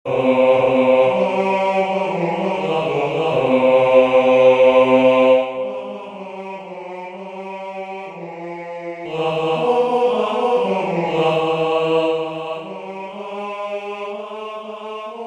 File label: bass